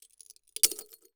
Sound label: Crash Glass Crack; crashes; AdobeCC